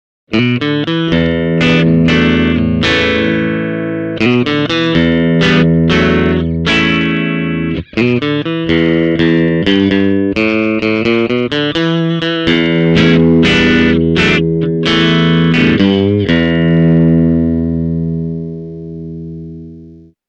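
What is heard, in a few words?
Squier Clean, classic strat humbucker; Czysta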